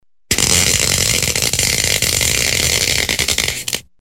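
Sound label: sound of electricity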